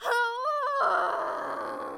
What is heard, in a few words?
anger strong; VIVAE